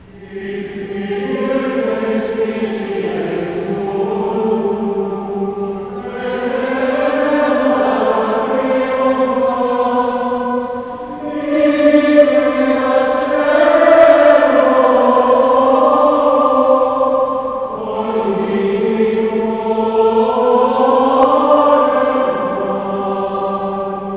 Magnificat antiphon repeated; Colloquium; Vespers

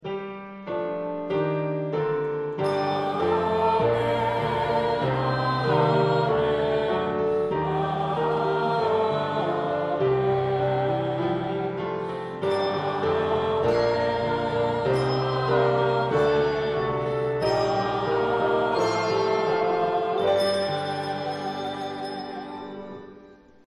Amen, Bells

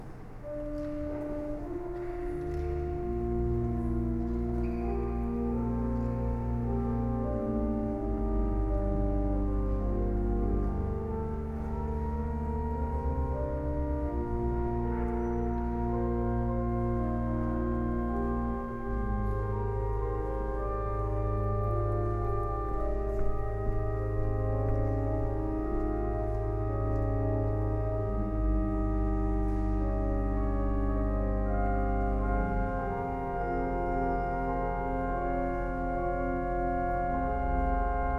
Organ Interlude; Colloquium; Mass

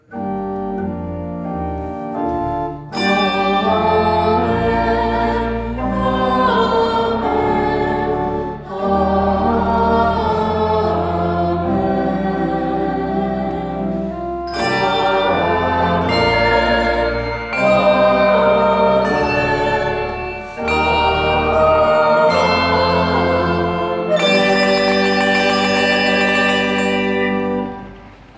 Amen; StJ bells

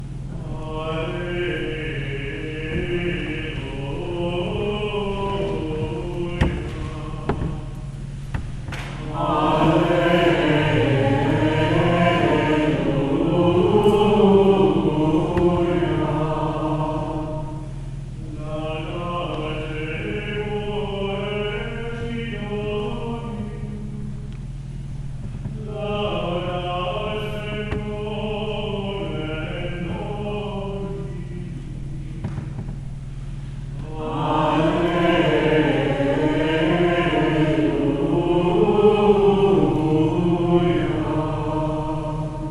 Alleluia Chants; Winter Colloquium; Mass; Abreges; Laudate pueri

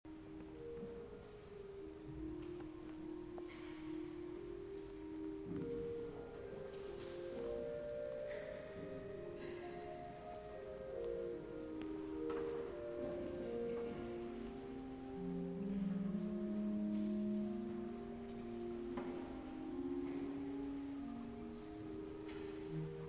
Organ Communion Interlude; Colloquium; Mass

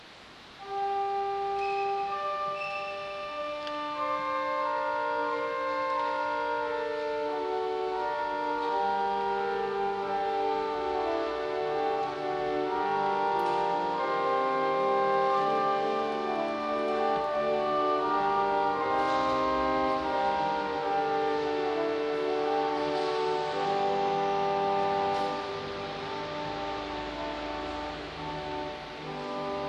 Organ Interlude; Colloquium; Mass

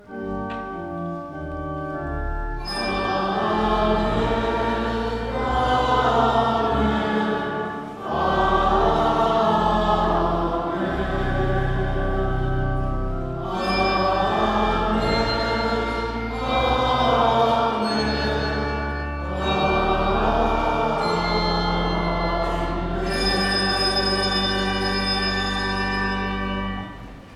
Amen; StJ bells

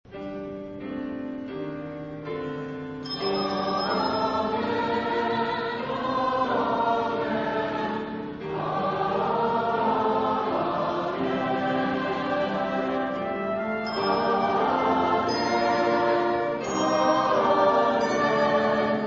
Amen; Easter Sunday

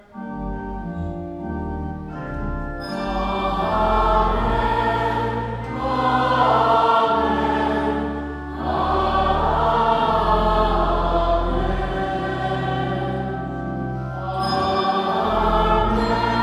Amen; StJ bells

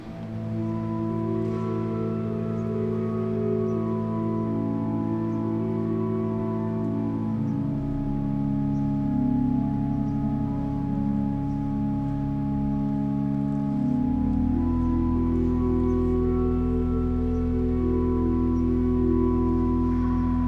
Organ interlude; Colloquium; Vespers

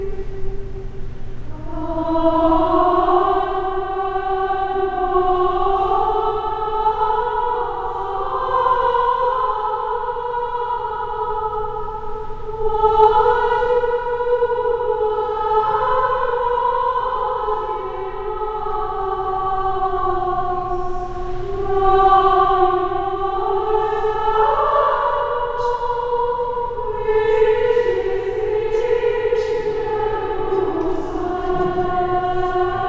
Offertory chant; Colloquium; Mass

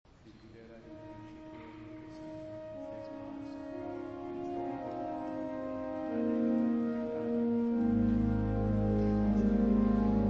Organ Introit; Colloquium; Mass

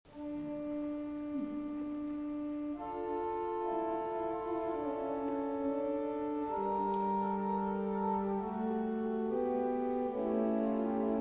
Organ interlude; Colloquium; Vespers

